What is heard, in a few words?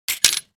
Casino game slot machine insert coin coins